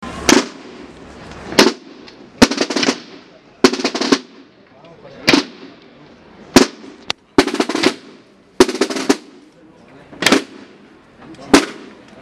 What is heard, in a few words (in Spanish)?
tambores